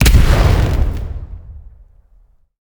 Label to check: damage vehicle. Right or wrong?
Right